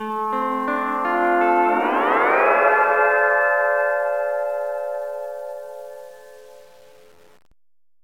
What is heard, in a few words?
sms hawaiian